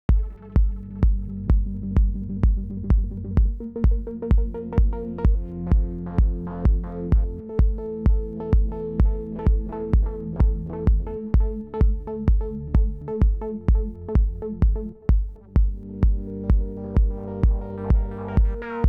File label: miniloop